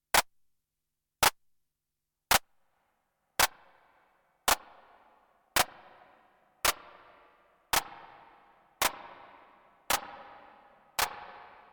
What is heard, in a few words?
noise clap